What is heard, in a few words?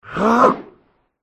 chaos titan attack voice middle